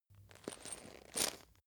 chain mail movement

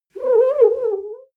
clean window paper towel slow howl

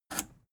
plastic button scratch push enable

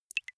single drops falling

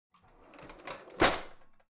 old drawer creaky slide out

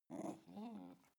dog snarl playful

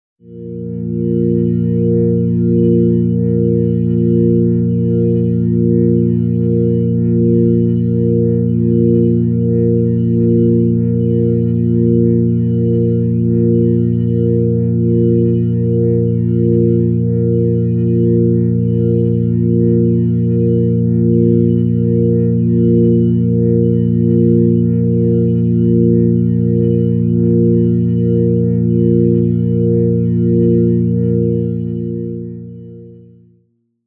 organ chord neutral bb

